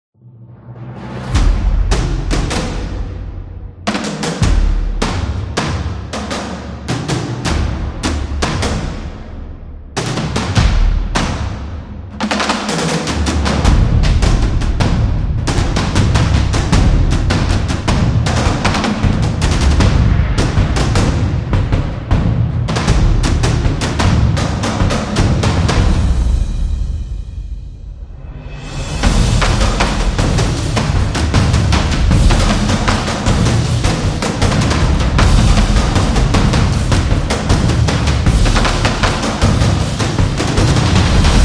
The Tribals, epic drums

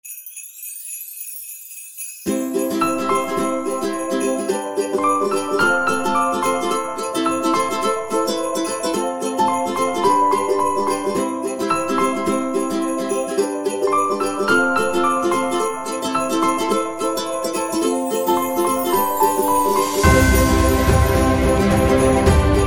Happy Christmas Music

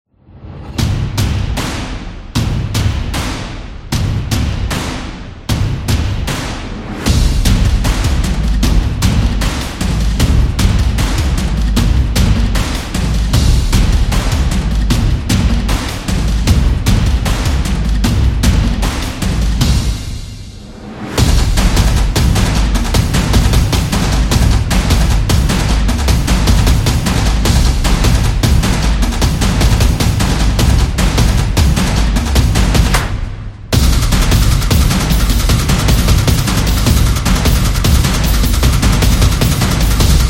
A Drumming

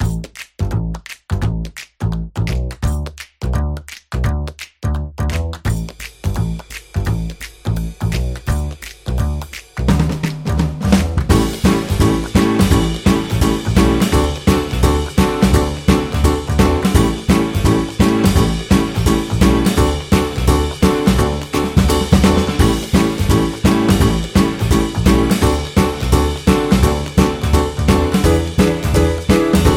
Upbeat Jazz

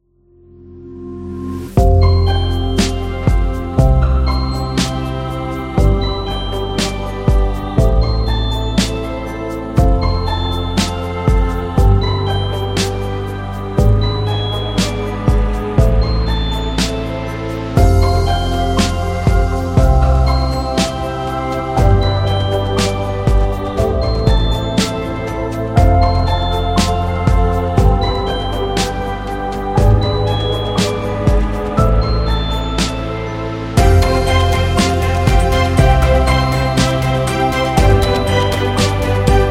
Cinematic Chill

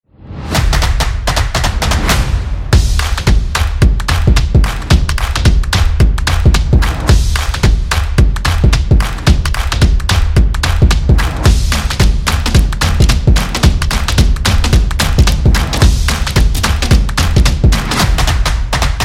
The Drums

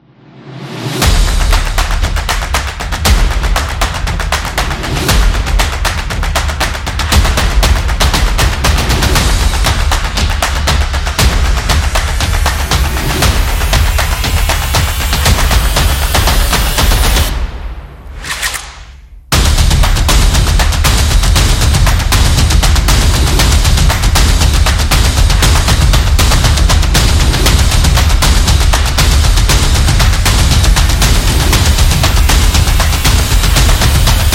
Drum; Percussive Nation Vol 10

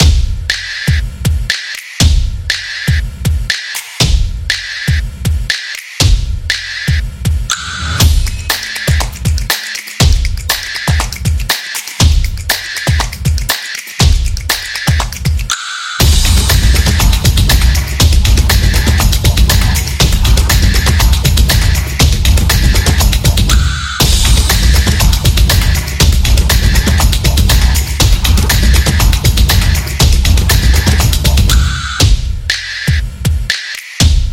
Stomp

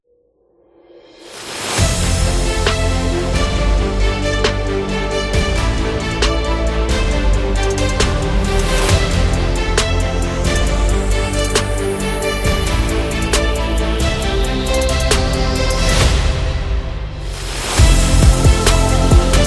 Hip Hop Epic

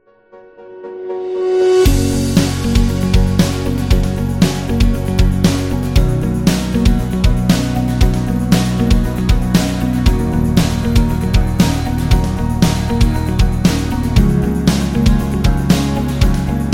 Christmas Magic

